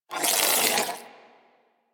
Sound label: Ball Held Spark; Warframe; Missions; Tenno Ball